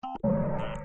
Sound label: concrete